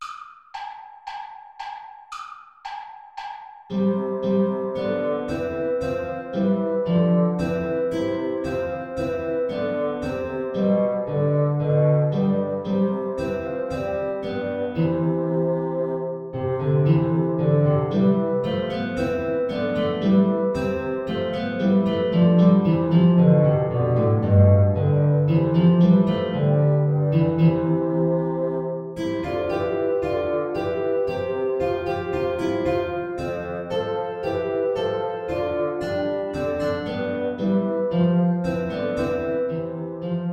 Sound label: air play along without trumpet